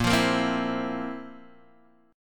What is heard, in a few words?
Bb Suspended 2nd Sharp 11th Bb; strummed; chord shape